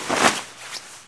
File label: jump, cloth; hl2